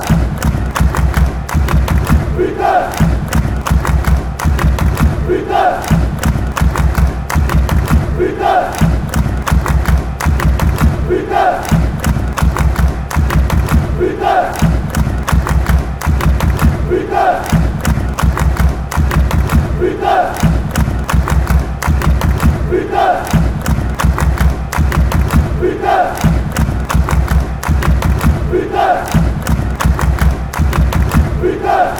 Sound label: claps